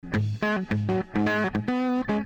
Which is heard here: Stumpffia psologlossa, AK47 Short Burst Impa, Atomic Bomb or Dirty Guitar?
Dirty Guitar